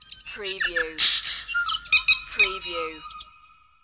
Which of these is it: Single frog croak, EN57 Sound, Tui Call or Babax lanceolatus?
Tui Call